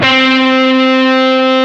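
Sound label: DIST GT3 C3, distorted guitar; DIST GUITAR2; AKAI S-Series CD-ROM Sound Library VOL-7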